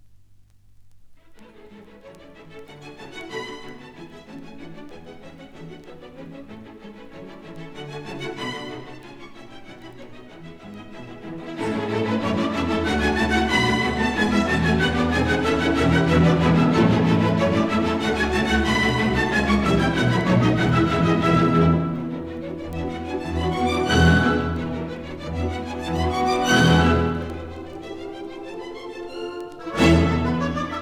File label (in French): Petite Suite d'orchestre; Bizet; Jeux d'enfants; Munch